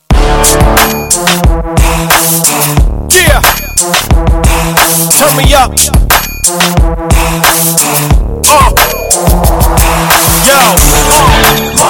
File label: ringtone; nfs most wanted movie hollywood